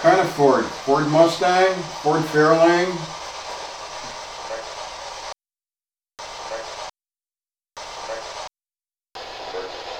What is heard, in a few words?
attic big room car